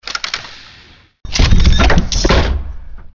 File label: door; cstrike